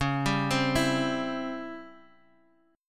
Db Minor Major 7th Flat 5th Db down Guitar Half Step Down